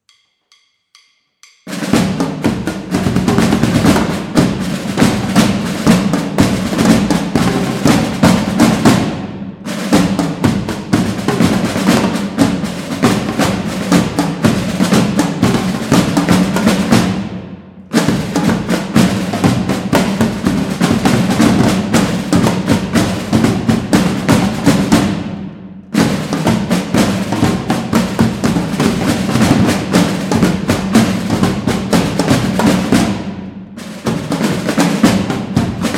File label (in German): Tambouren Zugabe